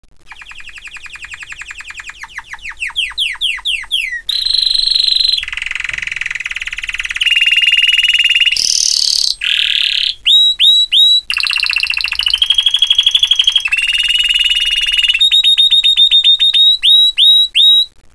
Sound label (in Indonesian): kenari chopper